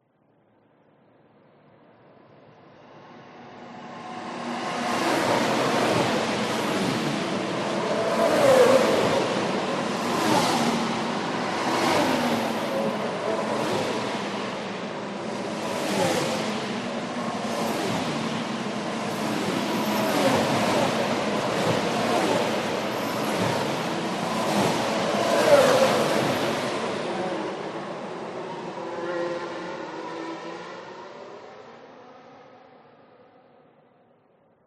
f1 race track pass speed

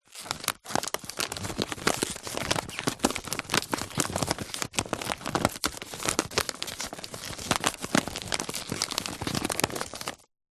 foley present gift wrapped handling; zapsplat

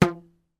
foley rubber band ping tight; zapsplat

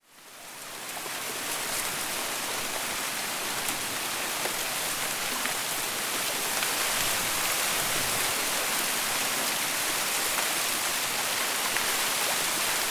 nature wind palm trees canal water lap close by

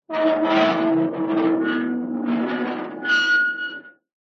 metal huge heavy scrape screech

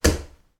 footstep bare foot tap on lino floor; foley

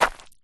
foley footstep single stoney path; zapsplat

